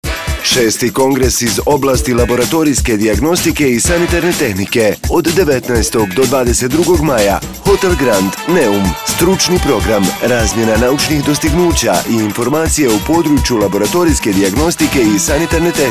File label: Jingle; Kongres ALISZP Neum 2016